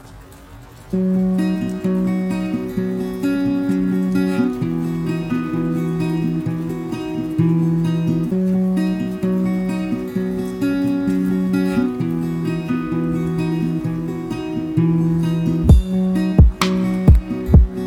blinds instrumental

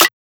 snare plink

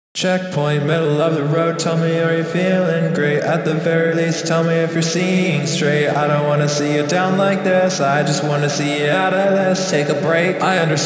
182bpm Dmaj vocals

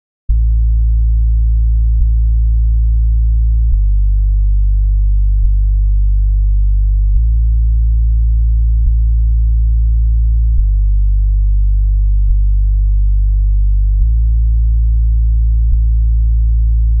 bass

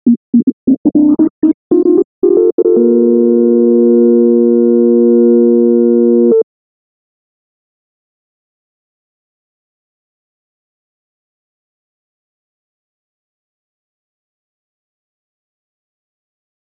152 SYNTHS

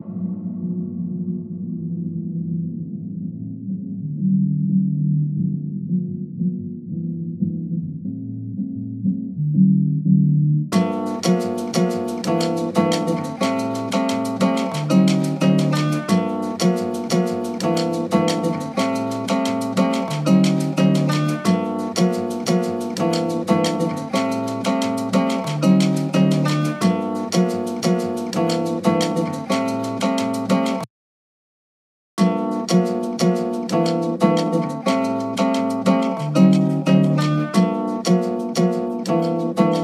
guitars

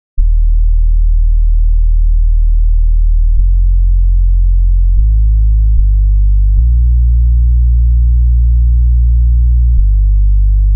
bass

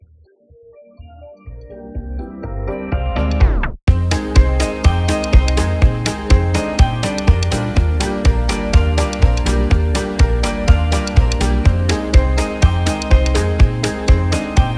instrumental

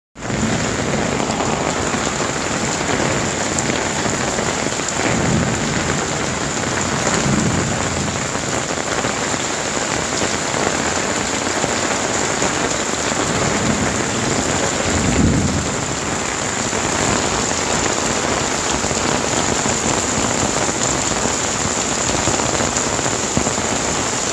rain

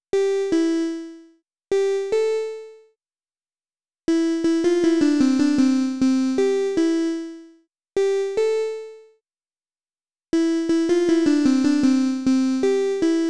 melody

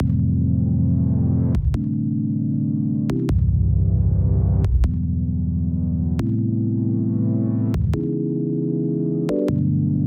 chords 155bpm

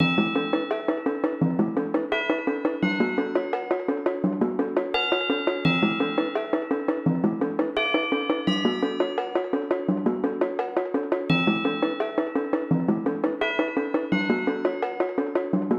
bell + melodic drum thing

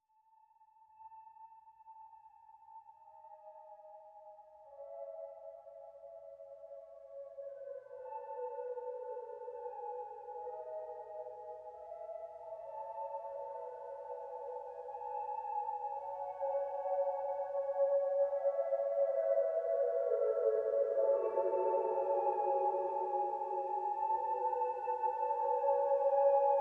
ambient whale synth

